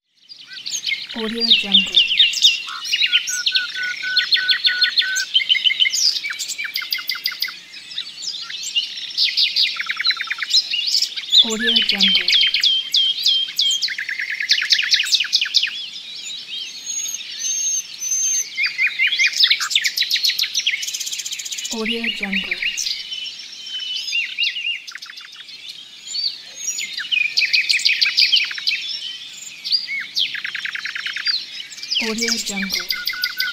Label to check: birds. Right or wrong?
Right